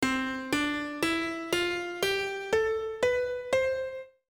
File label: Musical (Guitar Scale); Pokemon Black and White